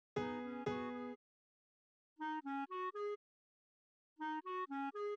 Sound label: piano melo